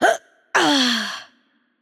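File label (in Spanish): Death, Spanish; es ES; VO; bloom minigame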